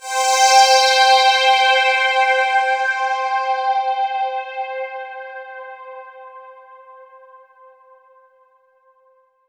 Roland JV 2080, interval; MG INTERVAL

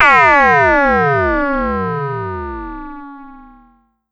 Pollard Syndrum

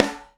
HeatWave, Beyond Snares; Airwindows

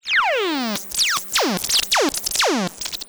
Odd Modular; radiosonics

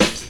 SD, Dance Drums

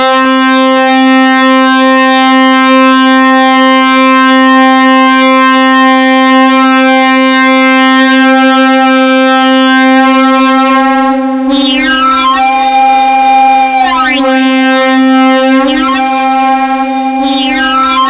C4, synth; 90 s Sample CDs; NiceBeats; SynthLine VOL-25

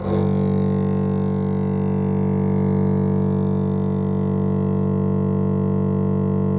DBL BASS, Synclavier Strings; ILIO

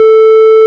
ORG, Farfisa Clarinet

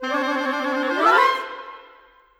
Winds, Orchestral; Sonic Foundry (Sony Creative Software); Orchestral VOL-2; Modern 14

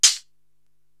HH Lofi Close, Korg TR-Rack drum machine

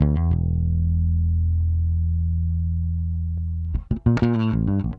Bass, 70s Analog Funk; Sonic Foundry (Sony Creative Software); What it Is!; Slinky's Place

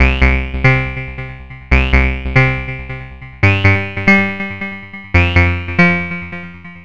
NRG Lead, trance

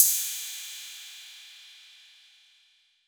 PERC 808

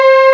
SYNPAD

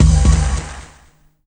SND, Hip Hop R n B; USB Soundscan vol.19

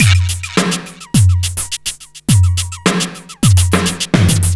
big fat lump, 105bpm; Zero-G; Freak Beats

